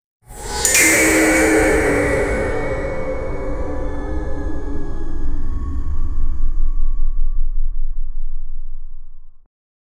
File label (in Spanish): una mirada epica oscura d, blade